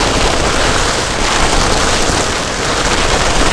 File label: roll snow, terrains; Tony Hawk's Project 8; XBOX